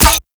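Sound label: Full Draw Mech, Weapons; Infested; Cernos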